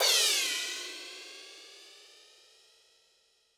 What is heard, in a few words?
Dance Club, Drum Machines, Korg 01W; 300 Drum Machines